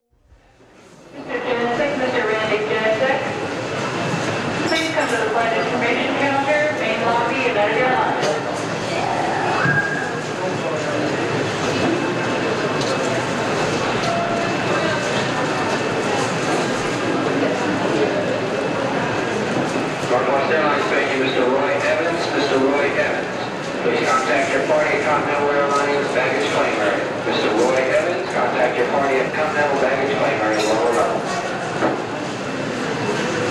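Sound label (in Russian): фон в аэропорту с объявлениями жен и муж; Звуки аэропорта; Звуки атмосферы терминала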